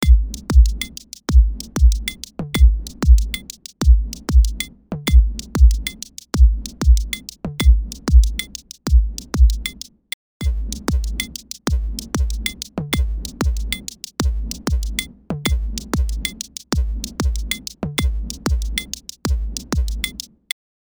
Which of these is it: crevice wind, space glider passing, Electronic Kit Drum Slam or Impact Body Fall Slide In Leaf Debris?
Electronic Kit Drum Slam